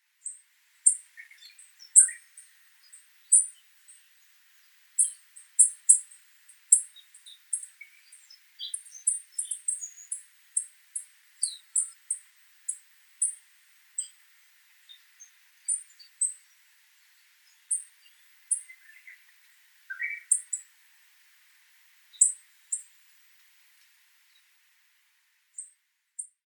shiroharahojiro, nagasaki

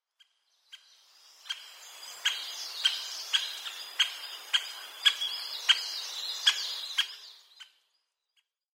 ezo akagera; obihiro; koe

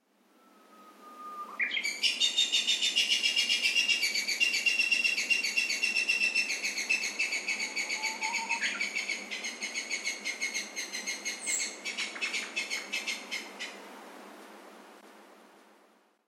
yanbaru, kunigami; ヤンバルクイナ; 地鳴き; 沖縄県国頭

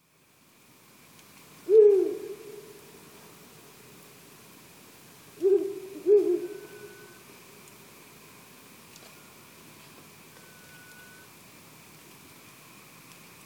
fukuro, tsubetsu, koe

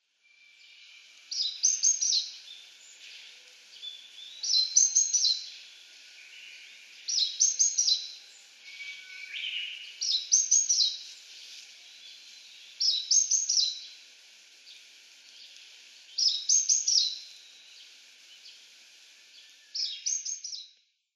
shijuukara, utsunomiya

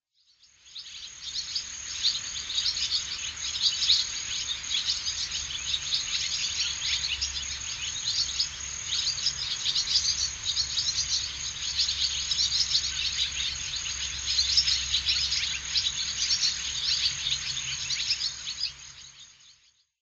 tsubame, fuchu

